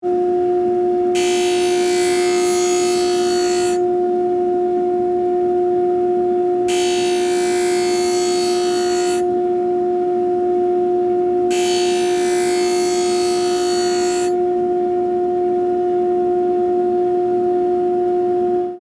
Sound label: Ice Skate Sharpen, Blade; Sports; Sharpener; Ice Skating (Sound Effects:Sports)